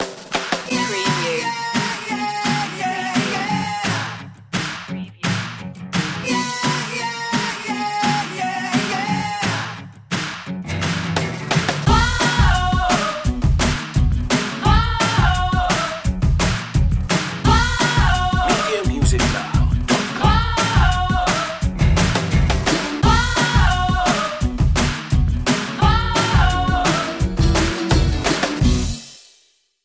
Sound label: vibrant voices